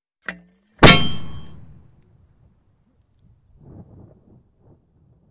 German 8.8 cm Infantry Mortar - Has Tube Drop / Concussion Exit And Metallic Impact